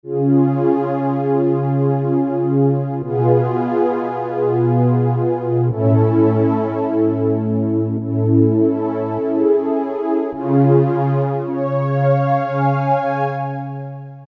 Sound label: Royal Pad, Korg MS2000; Factory Patches